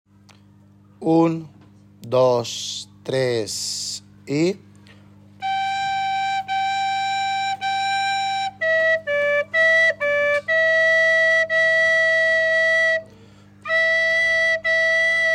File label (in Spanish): Flauta